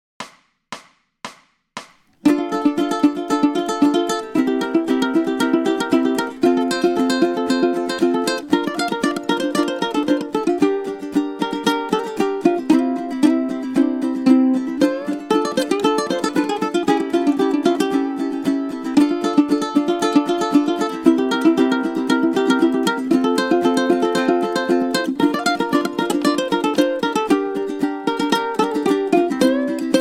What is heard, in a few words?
solo 115bpm